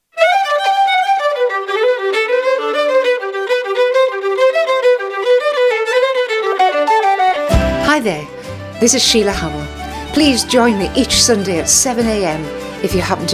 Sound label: radio jingle; radio tyneside